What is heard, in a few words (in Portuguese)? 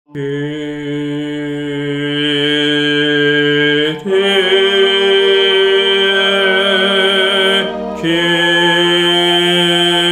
baixo; kyrie